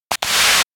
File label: elec radio noise; anemoi